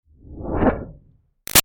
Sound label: Up Liquidy Fast; Sound Design; Stops